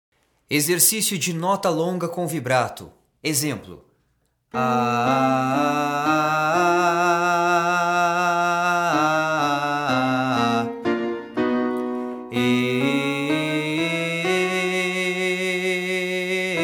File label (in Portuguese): Vibrato Exercício